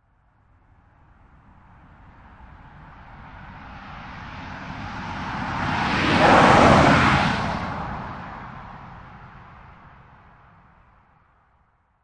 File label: Car By And Away Dry Road 45mph